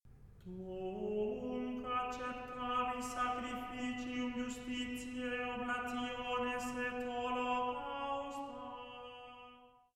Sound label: Gregorian Chant; Miserere; Psalm 50; Tunc acceptabis sacrificium